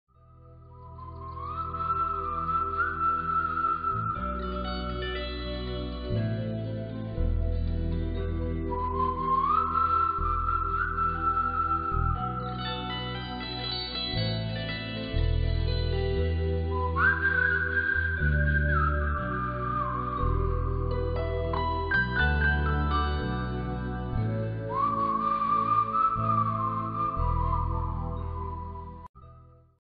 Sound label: whistle bgm